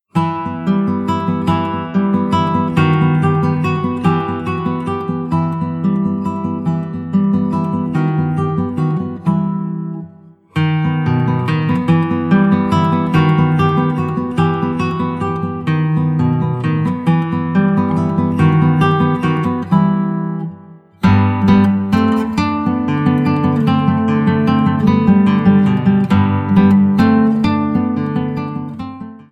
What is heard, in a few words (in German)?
walzer; ferdinando carulli